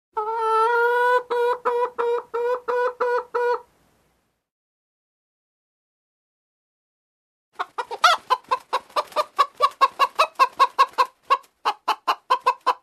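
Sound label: chicken voice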